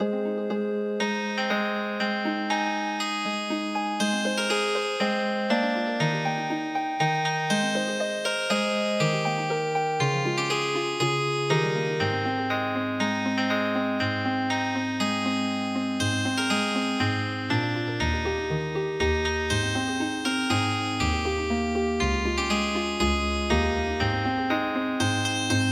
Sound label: Healing Harp, traditional; The Star Spangled Banner